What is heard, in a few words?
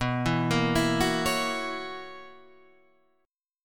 B Major 7th Suspended 2nd Suspended 4th B down Guitar Standard; BM7sus2sus4; arpeggiated; JGuitar